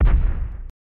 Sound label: Fireworks Explosion